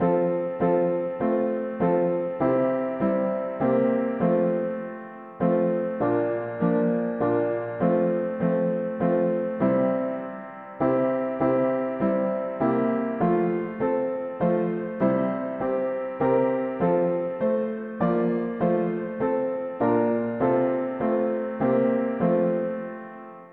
mono piano, hymns; We bless our Saviour s name

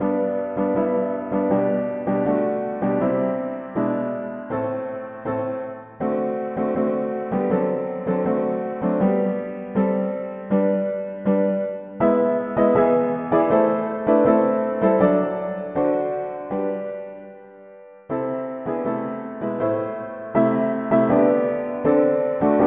mono piano; hymns

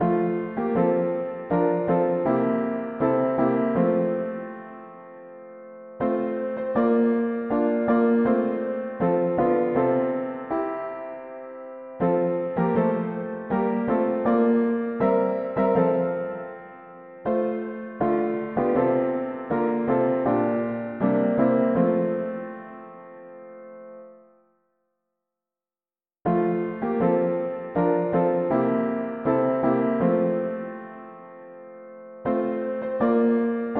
mono piano; hymns; We ll sing of the Shepherd that died

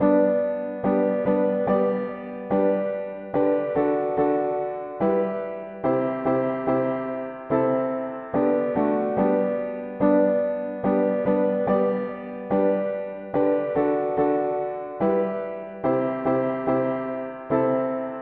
mono piano; hymns; Salvation s Captain

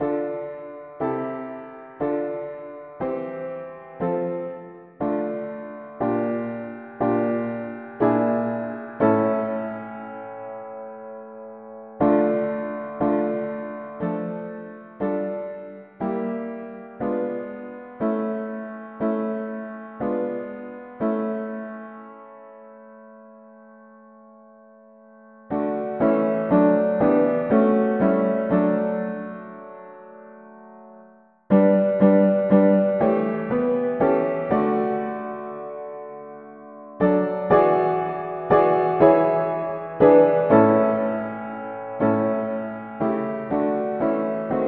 mono piano; hymns; Que dois je faire

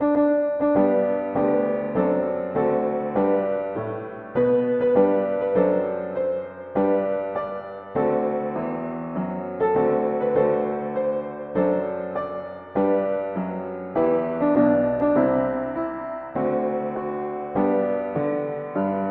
mono piano; hymns; N entends-tu pas

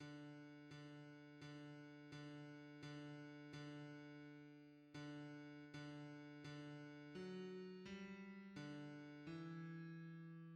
bass, hymns; O lumiere ineffable

